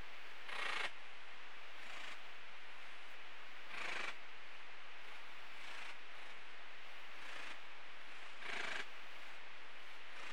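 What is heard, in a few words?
R annulata heterodyne 23°; Collias30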